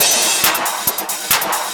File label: percussion loops, 138, Techno